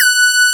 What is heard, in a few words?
PL MOOG; Wizoo - Powered Nord; STEREO LAYER